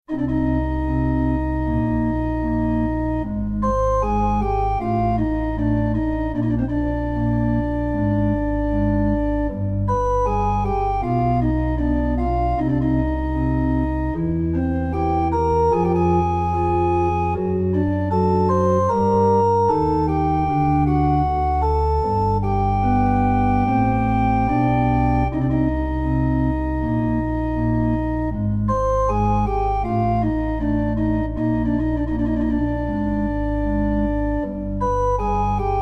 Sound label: Pipe Organ 2